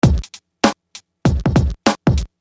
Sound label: Beat, Hip-Hop Street Beats